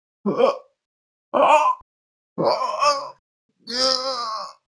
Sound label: sombody dying